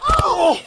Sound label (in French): chute